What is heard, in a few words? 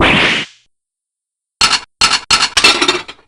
damage; aj olga